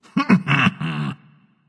heh heh, voice; Smite; ymir skin14

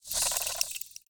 scorpion insect click; smite; npc

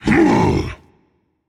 tongue rage; chaac

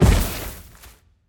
Bodyfall; Smite; god Kumbhakarna

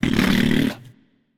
nv tongue; smite; voicepack; hachiman

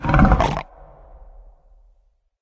idle breath; smite; Anhur

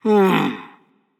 thinking; smite; ymir

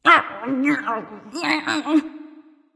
nv grumble; smite; awilix

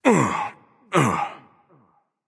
grunt lift

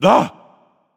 notice; danzaburou

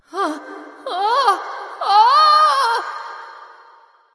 fear light; smite; voicepack; hel

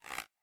minion bow shoot; smite; npc; roman archer

